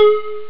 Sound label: bell